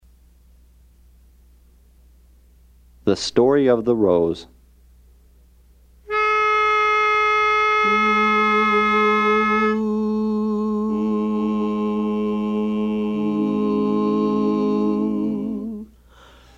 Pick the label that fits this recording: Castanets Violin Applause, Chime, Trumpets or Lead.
Lead